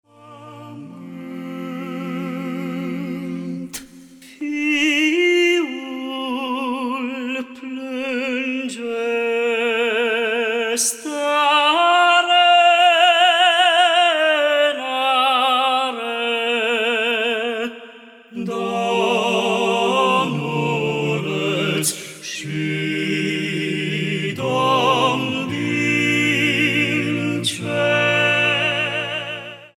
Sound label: Voice Quartet; Domnulet si Domn din Cer; craciun